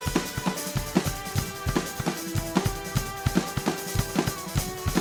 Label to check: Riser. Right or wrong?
Wrong